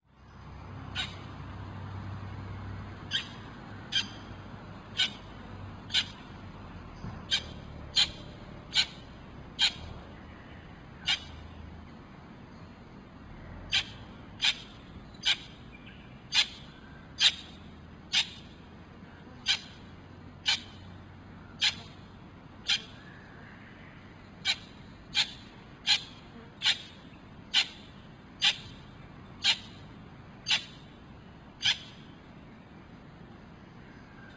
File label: australian king parrot